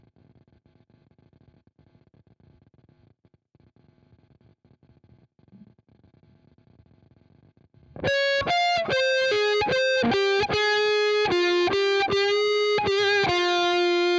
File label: Guitar